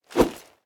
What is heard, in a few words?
minion swing; smite; npc; roman archer